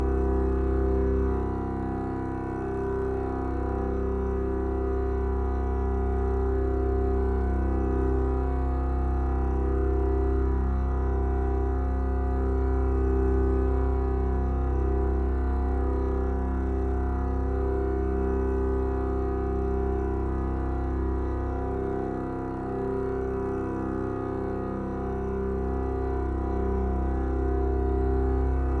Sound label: Deep Strings Church Intro; bramble the mountain king; ps4 ps5 switch windows xbox one xbox series xs